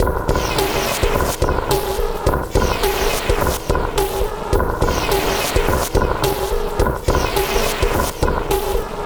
Sweep Loop; Sonic Foundry (Sony Creative Software); Esoterik Beatz

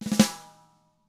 ruff, snare; ILIO; Double Platinum Drums 1

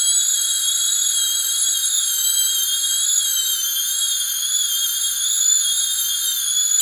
SWEEP HI, Yamaha VP-1, SWEEPER; Music Store

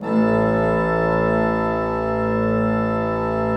PLENO A1, Cathedral Organ; ILIO; Propeller Islands

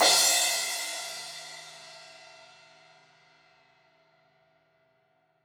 Crash 16in edge, Cymbals; Deeply Sampled Drums

